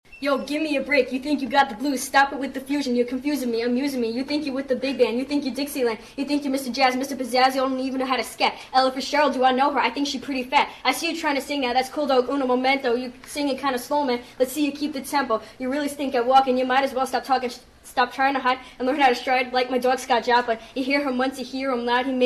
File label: jazz rap